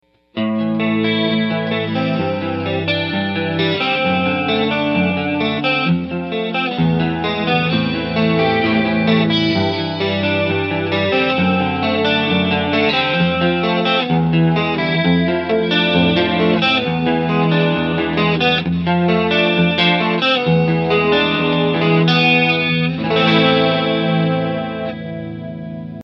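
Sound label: quad chorus clean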